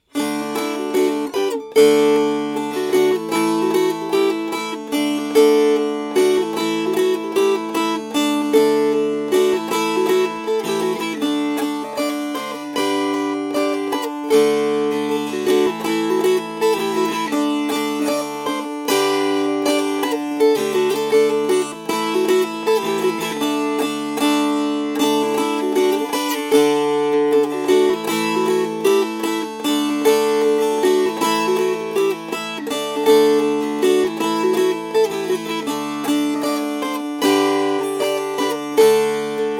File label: Svetit Svetel Mesyats Strummed